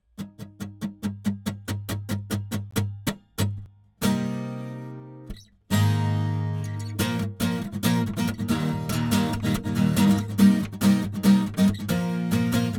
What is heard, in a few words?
dry Acoustic Guitar